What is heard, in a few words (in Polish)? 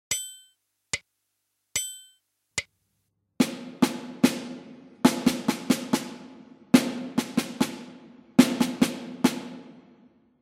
rytm puzzle